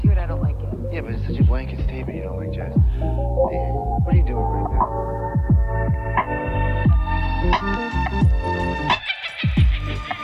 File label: ambient